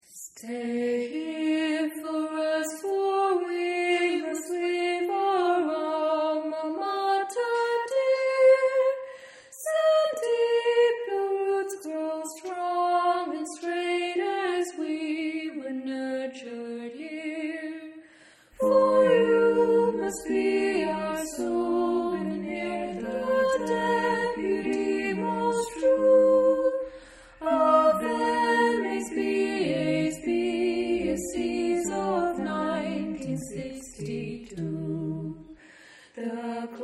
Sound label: Tree Planting Song